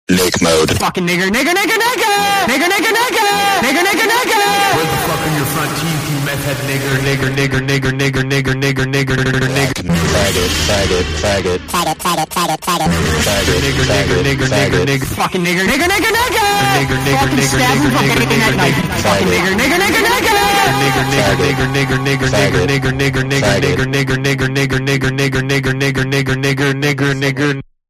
hahaha

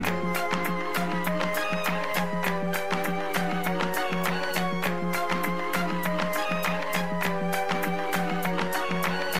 instrumental

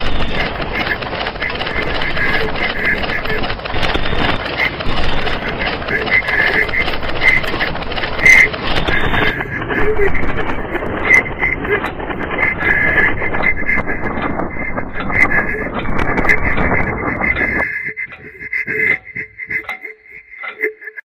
crying; laceys diner

